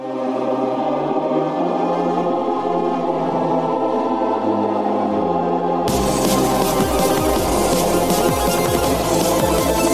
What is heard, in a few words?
damagee